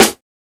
crunchy snare sample c sharp key

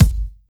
steel kick drum sound g sharp key; kick drum samples

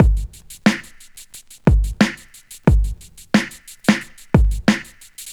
90 bpm old school drum loop G sharp key

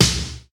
snare drum sample c key

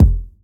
natural bass drum sound f key

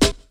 00s short acoustic snare sample d sharp key; snare drum samples

